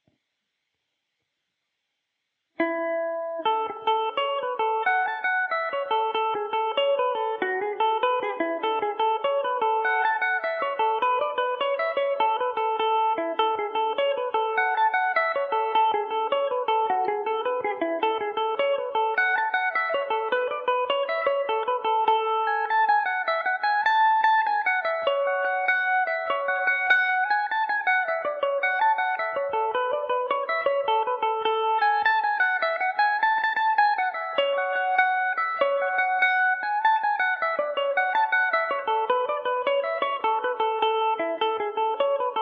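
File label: A major, Irish mandolin; humours of ballycastle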